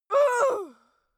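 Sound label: female death, screams; schreie; menschen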